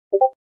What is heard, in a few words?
Notification; Discord